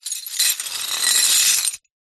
impacts dish ceramic pieces drag ground in cloth bag